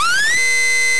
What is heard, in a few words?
warn